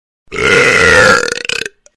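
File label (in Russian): отрыжка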